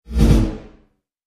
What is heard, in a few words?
Bass Impact Double